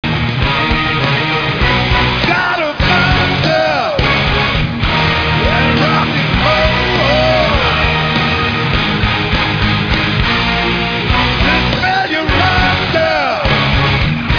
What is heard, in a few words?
kiss